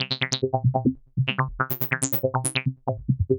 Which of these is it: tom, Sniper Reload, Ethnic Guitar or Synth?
Synth